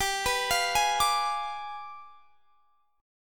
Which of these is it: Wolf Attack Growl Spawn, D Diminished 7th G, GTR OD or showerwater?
D Diminished 7th G